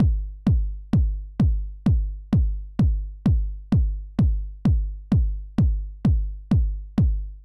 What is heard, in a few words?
KICK